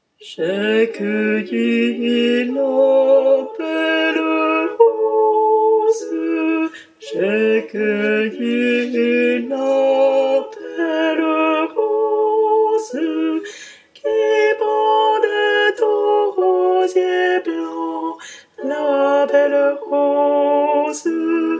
basses; J'ai cueilli La belle Rose

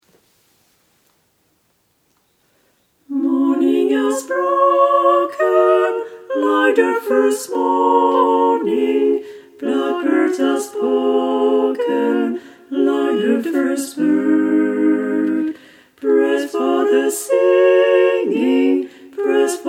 tutti; Morning has broken

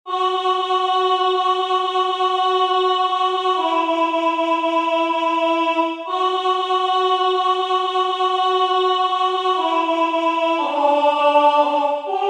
alto

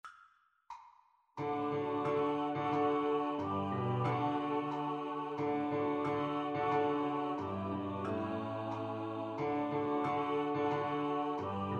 Basses Piano; Kum Bayah

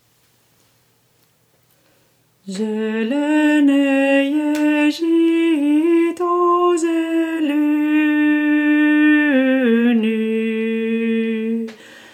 zelene zhito soprane

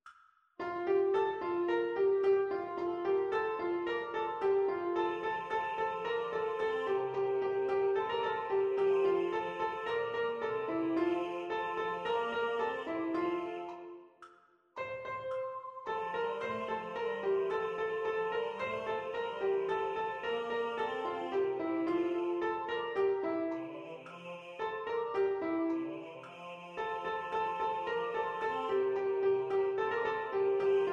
Alti Piano; VIVA TUTTE LE VEZZOSE

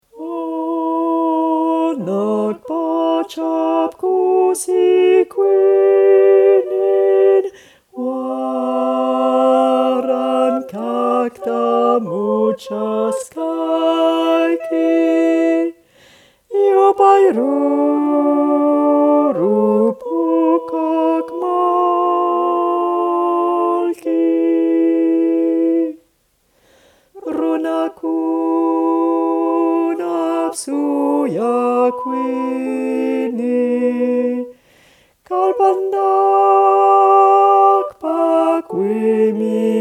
hanacpachap basses; Hanacpachap Cussi Cuinin

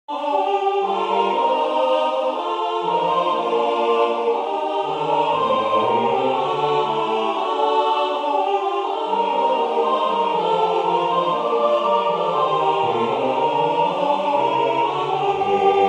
tutti

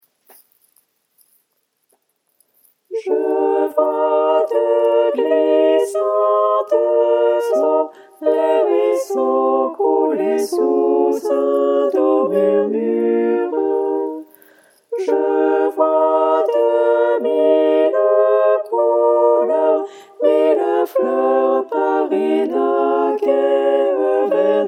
tutti; Je vois des glissantes eaux

